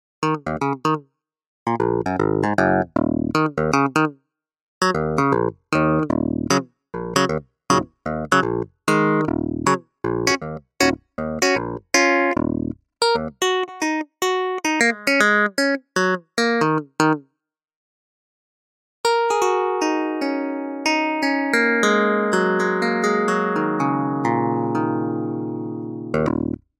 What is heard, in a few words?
Clavinet D6 Sound